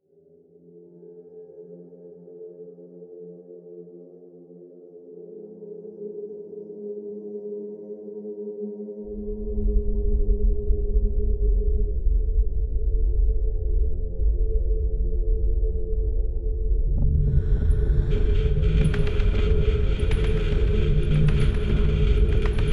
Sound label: If We Were Birds Soundscape; for Sc 14 The Rescue